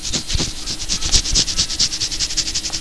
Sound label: SCRATCH